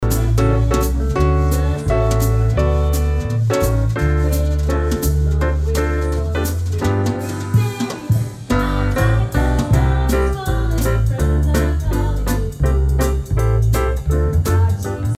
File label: full instruments vocal bleed example